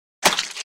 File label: Object Being Squashed; Fights Bodyfalls